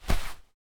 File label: Cloth, Handle, Grab Person Wearing Raincoat; DeltaVR; FMOD